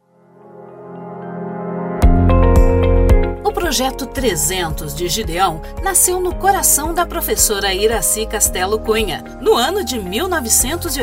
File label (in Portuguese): VINHETA; PROJETO GIDEAO